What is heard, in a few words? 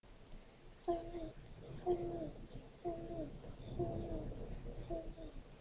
flute